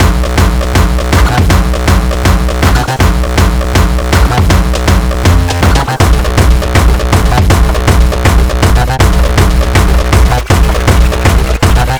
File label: Beats Loop; Anger Foot